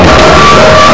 robot search sound; running-man

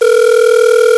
outgoing call; Netscape Conference; Netscape Communicator

